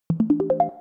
open men

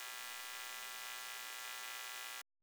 buzz loop cleaner

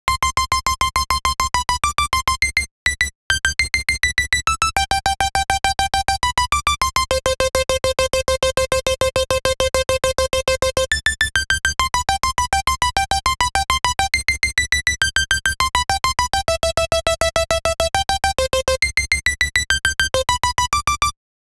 Serum Synth Stabs

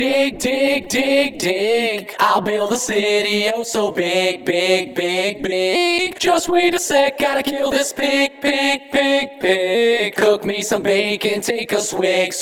Vocals

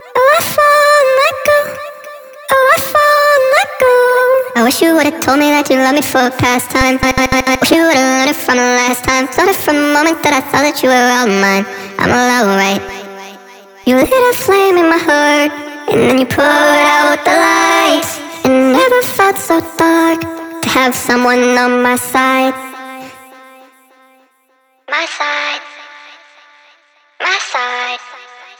Vocal

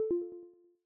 Peer Leave

